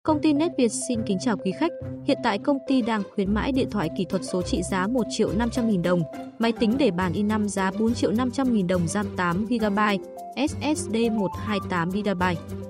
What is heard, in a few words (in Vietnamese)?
nhac